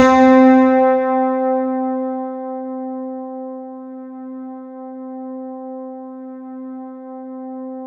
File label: C3, synth; 90 s Sample CDs; NiceBeats; SynthLine VOL-27